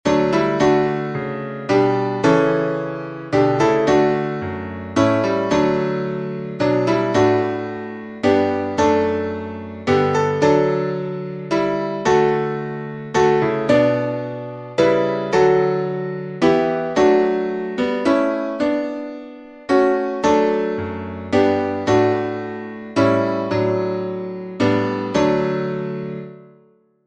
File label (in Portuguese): salmo playback